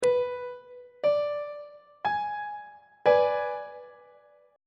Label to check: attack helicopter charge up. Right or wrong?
Wrong